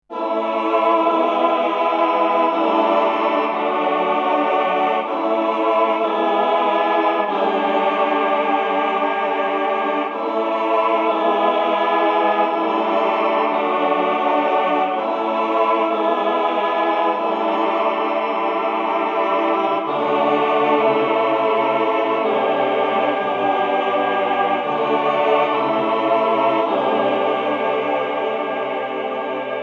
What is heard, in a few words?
Male Choir Tape; VIVO S10